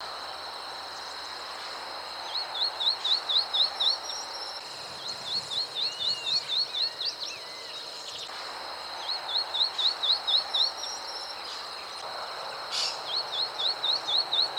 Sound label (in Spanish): tacuarita azul voz, aves